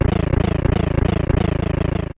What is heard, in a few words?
Bubble; Wart